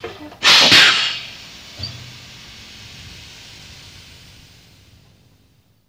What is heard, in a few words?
Air Brake Diesel Truc; brakes